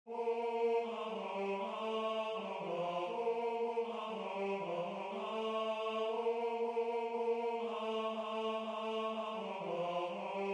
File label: Candlelight Carol baritone